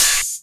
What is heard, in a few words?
Open HH, Cymbals